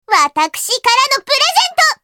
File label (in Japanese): ファイアブリル; ルディン